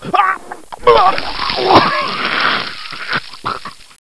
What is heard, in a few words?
Puke; iLinux